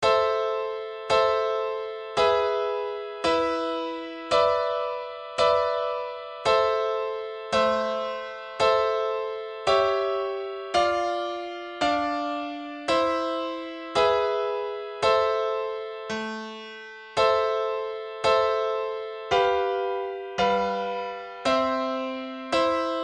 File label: etude solo